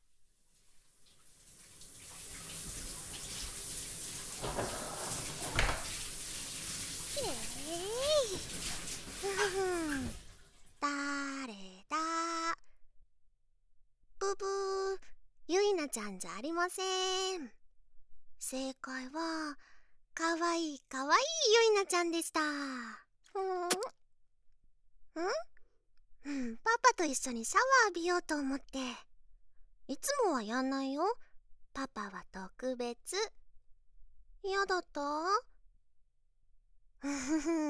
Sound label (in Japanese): 耳舐め ローション 手コキ リバーブなし, ASMR